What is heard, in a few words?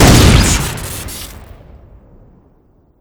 super shotgun shoot crit; weapons